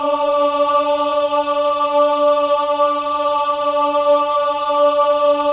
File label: MEN AH, Choir of Ahs; Roland - LA Composer vol. 1